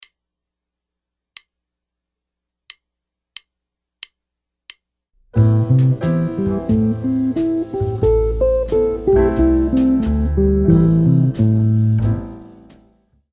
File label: arpeggios, guitar